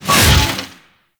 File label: Melee Whoosh; Warframe; Sentients; Erra